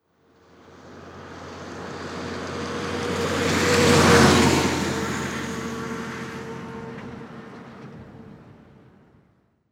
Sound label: diesel truck pass quick